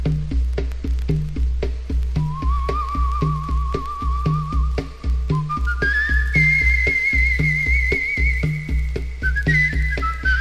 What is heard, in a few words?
WHISTLE BONGOS; mondo-strange